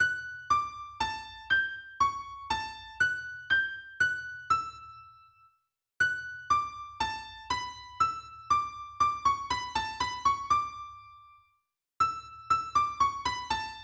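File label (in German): Arietta Solo E-Gitarre; Küffner; Melodie-Solos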